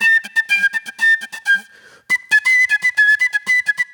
Club Flute

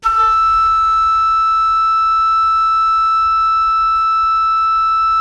FLUTE FF E6; Greytsounds; Philip Wolfe David Crozier's Saxophones

